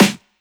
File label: Oldschool Snare